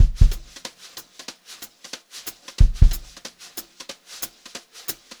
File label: jazzy beat, Brushed Drum Loops; 92 JAZZY; USB Soundscan vol.39; AKAI